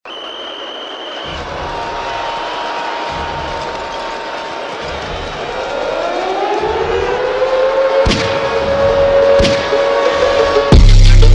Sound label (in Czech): Hymna